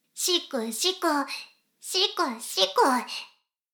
しこしこ音声; 牧場戦腺; 少佐殿

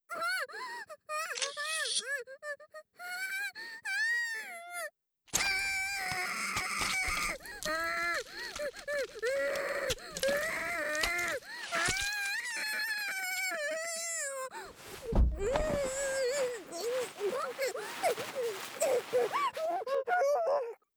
目を刺す; 効果音あり

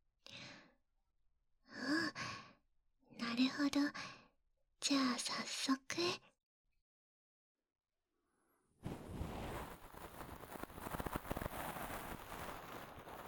音声のみの耳かきと耳ふー【BGMなし】, ASMR; ぐっすりVer

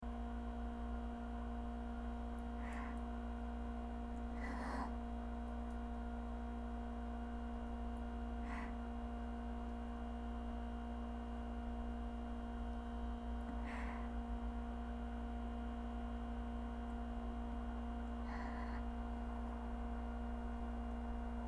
大、吐息とポチャ音; トイレ

